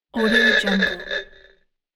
cartoon comedy ahooga horn

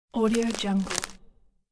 rotten tree branch crack; nature sounds; خرد شدن شاخه پوسیده

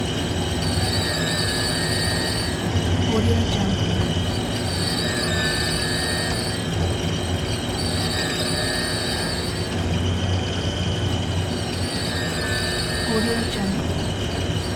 oil derrick arm turn squeal close; برشکاری لوله روغن

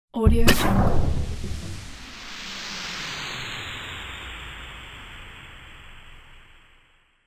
alien geyser deploy; futuristic; شلیک موشک لیزری فضایی

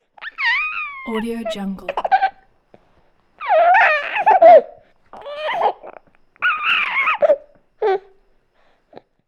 small baby laughing; human sounds; خنده ی بچه

